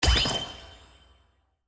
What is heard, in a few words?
Collect; SNKRX